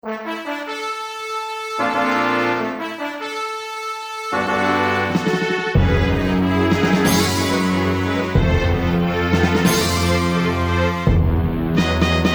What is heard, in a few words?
Fanfare